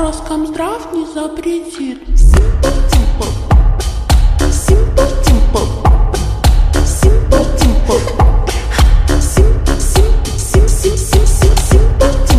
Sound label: SLOWED REVERB